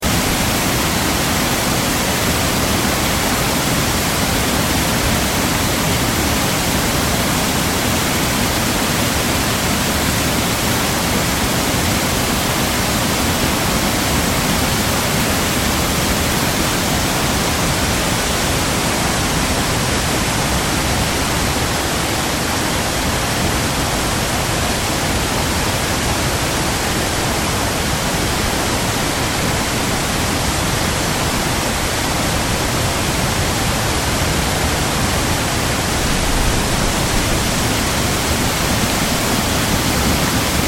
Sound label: waterfall on oak creek south milwaukee wi